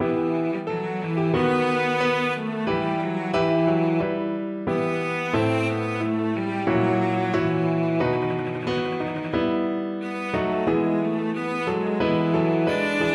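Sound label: violoncello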